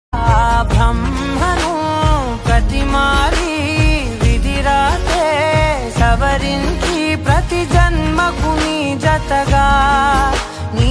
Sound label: love song telugu love song